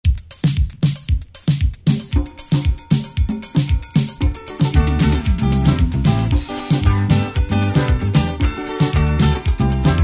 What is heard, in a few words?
Classic Party Grooves